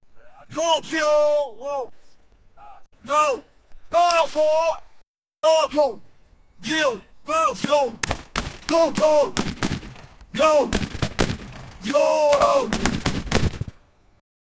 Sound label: five goons fighting with